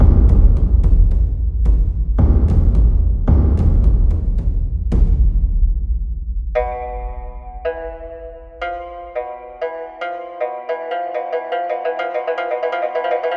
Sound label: tension alt; Oriental Empires